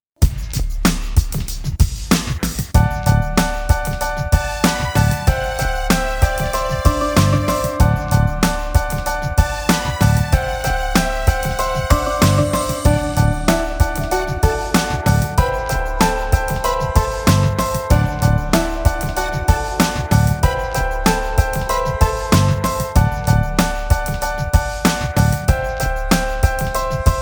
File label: instrumental